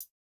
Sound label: no effect j city pop 1980 hihat